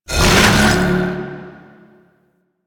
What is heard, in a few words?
Fusion Pillar Cast; Warframe; Qorvex